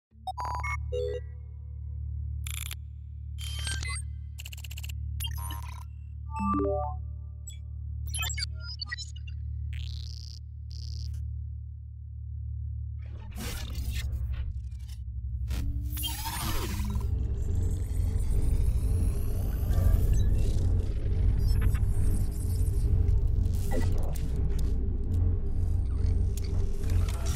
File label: Science fiction notification; نوتیفیکیشن علمی تخیلی